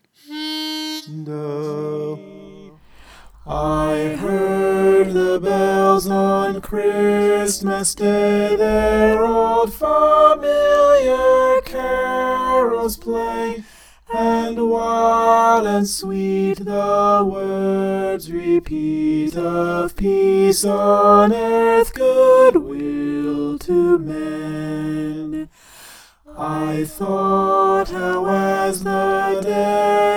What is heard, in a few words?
soprano